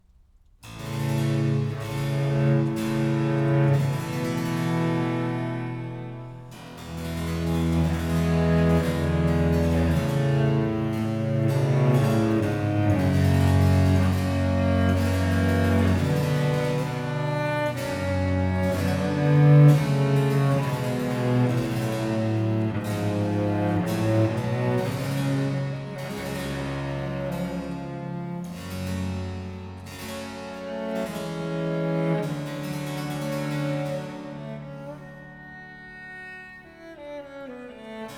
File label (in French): Sonata quinta pour violoncelle et basse continue; La Cetra d Orfeo, Michel Keustermans; en Sol Mineu